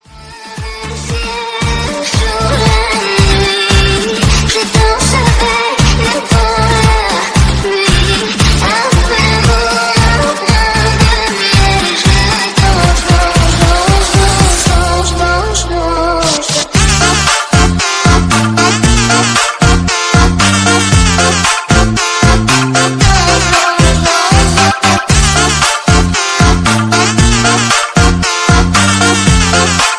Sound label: flute version